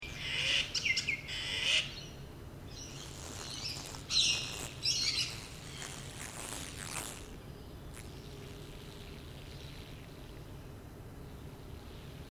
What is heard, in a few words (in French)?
oiseaux australiens